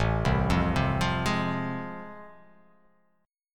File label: A Minor Major 7th Sharp 5th